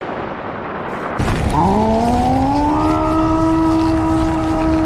roar; eren season four

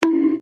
bonk

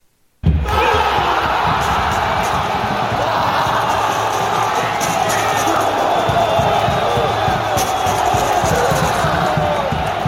football goal

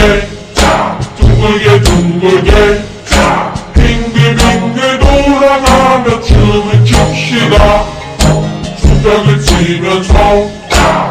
haha

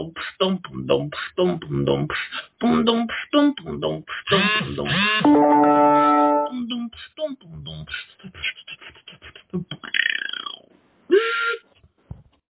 beatbox

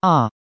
aww voice changer

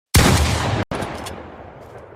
pump shotgun spas 12

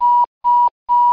suit beep beep beep; hev

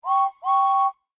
whistle

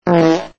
fart 2x longer

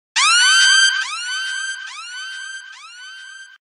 808 siren

